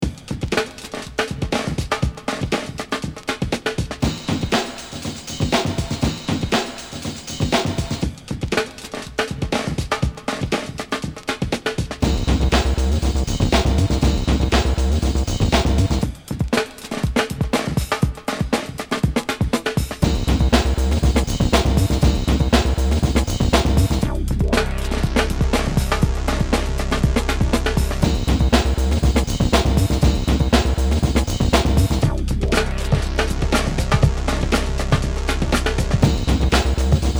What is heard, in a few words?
drumss